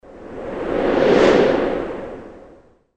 holodnyj veter; Звук ветра; Звук ветра для перехода в видео или для монтажного перехода в аудио монтаже

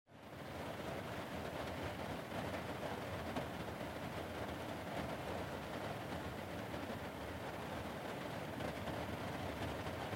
dozhd v interere

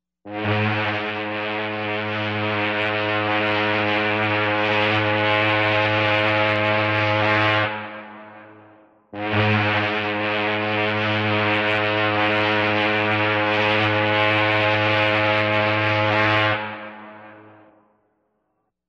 gudok laynera

